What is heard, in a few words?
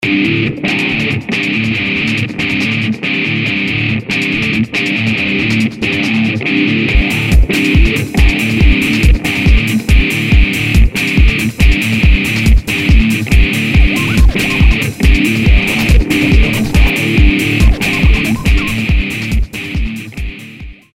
GUITAR KICK; Suite Coll. Vol.16